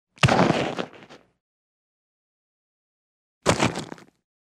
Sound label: fights